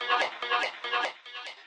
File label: voxuguy; VOCAL OR VOXY LOOPS